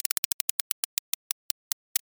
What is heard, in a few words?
roulette